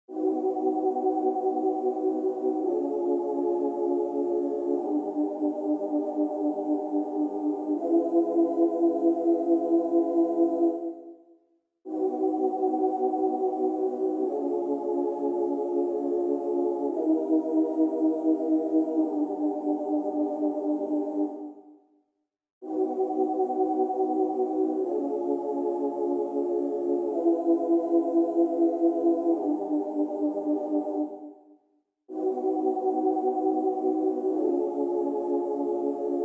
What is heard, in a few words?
136 bandpass pretty